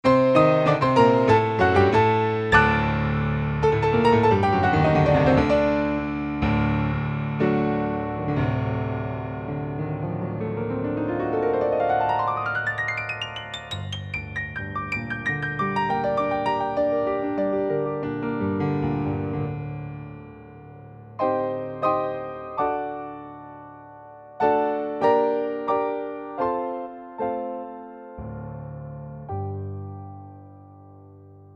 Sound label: v-piano all silver